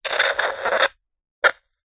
technology cb radio walkie talkie crackle interference; zapsplat